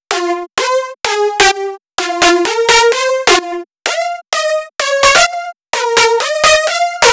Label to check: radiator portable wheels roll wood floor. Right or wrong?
Wrong